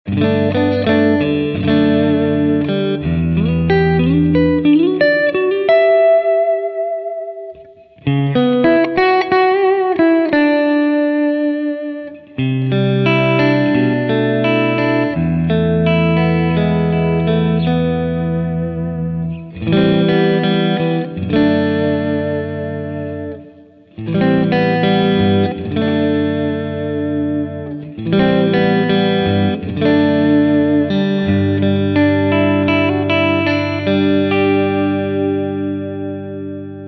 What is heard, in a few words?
Signature Series Nickel Steel 10 46; Dean Markley